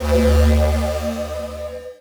Transition Communication Distortion Scanner; Production Element Title Transition

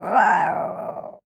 Animal Mammal Carnivore Cat Bobcat Snarl; animals; AdobeCC

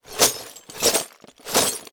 Foley Ceramic Plates Plastic Bin Rattles Slow

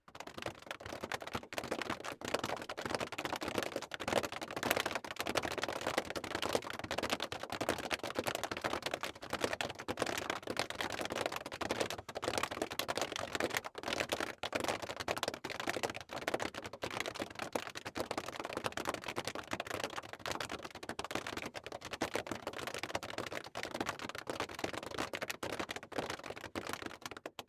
Human Crowd Horses Many Horses

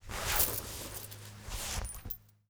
Coins Removing From Pants Pocket; Foley

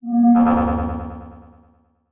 Title Transition Delay Short; Production Element Title Transition

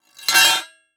Sword Drop On Ground, weapons; AdobeCC

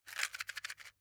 Household Matches Box Of Wood Matches Shaking

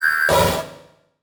Flash Blast; Multimedia Internet CD-Rom Flash

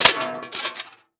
Impact Street Sign Hit Cement

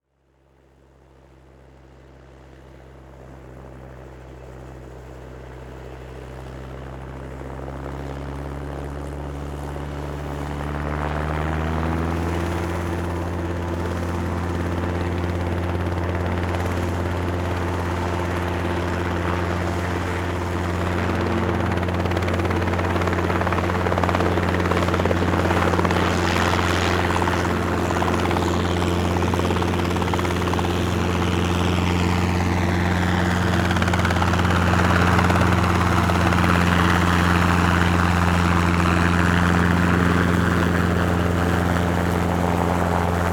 Transportation Helicopter Take Off

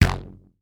Industry Rubber Band Snapping

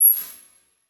Transition Hit Impact Ping; Production Element

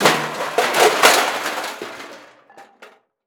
Crash Metal Objects Dropping On Cement; crashes; AdobeCC

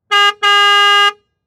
Auto Saturn Honk Horn Multiple; Transportation

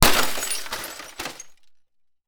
Impact TV With Sledge Hammer; Impacts

